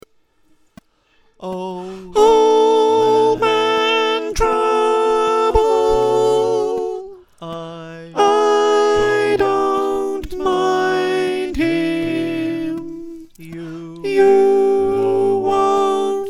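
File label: slow soprano, choir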